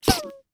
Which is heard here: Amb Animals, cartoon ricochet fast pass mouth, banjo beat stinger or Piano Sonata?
cartoon ricochet fast pass mouth